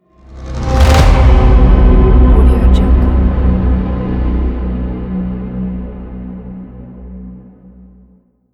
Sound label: viking logo